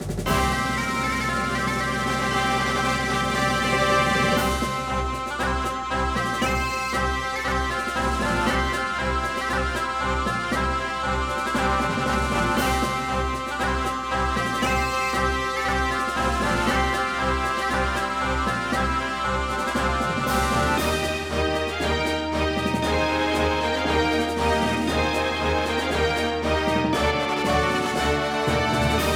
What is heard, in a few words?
March; Summon Night 3; PS2; March of the Male Troops